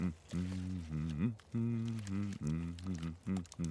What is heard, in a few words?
humming